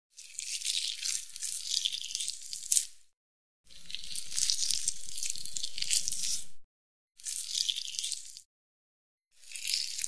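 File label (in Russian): хирургия делают разрезы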